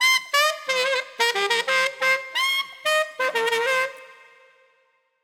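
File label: Squeaky Riff 8 Beat D minor 90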